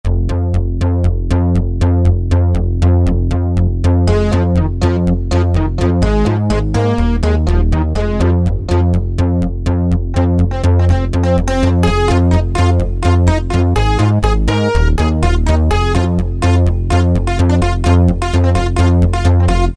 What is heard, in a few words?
To Be Bass, Korg N364; Factory Combi demos